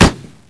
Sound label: hmg, weapons; hl2